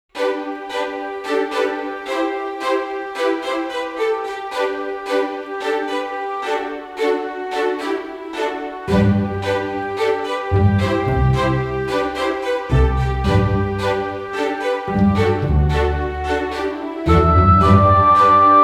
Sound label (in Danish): drama, baggrundsmusik; Spedalsk Bliv ren